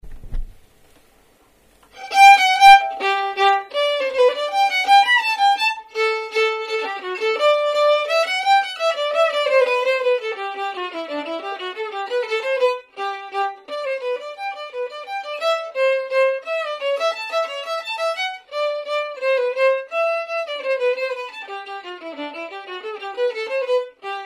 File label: Hornpipe Melody fast, violin only; Sailors; duets for violin only